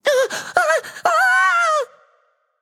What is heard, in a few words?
fear, voicepack; smite; jorm